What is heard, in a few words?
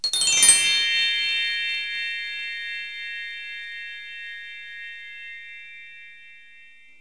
med scale, bells; Multimedia Cube